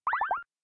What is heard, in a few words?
Speakers Deafen